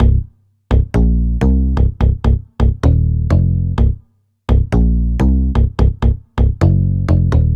Rubber Bass; Dr. Fink's Funk Factory

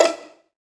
COL LEG C#5, STRINGED; East West; Global Sounds

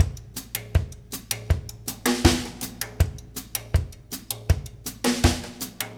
Russ Loop, Laidback; Sonic Foundry (Sony Creative Software); Loops & Samples Drum Tools

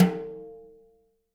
BRUSHES, Living Drums

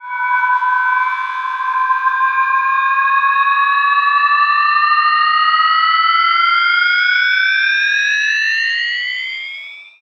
Riser; neuro; InsideInfo

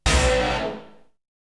Hit; ZERO G - Datafile DISC 1

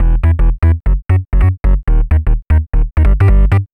Bass, uk garage, 128bpm

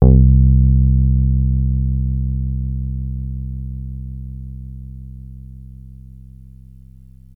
Access Virus B; Synthworx

